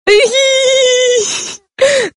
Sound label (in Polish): smiech